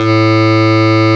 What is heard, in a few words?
ELEC ORG; CMI Sound Library Rev 1.3